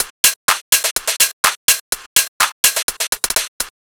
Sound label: Hi Hat; Afterhours EDM; Electro Shock; Sonic Foundry (Sony Creative Software)